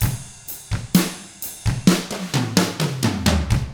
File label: 129 ROCK, Drums; ILIO; Double Platinum Drums 1